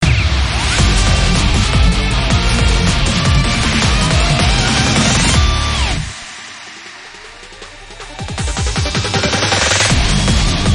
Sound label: Battle Card Summon; the king of fighters all star; android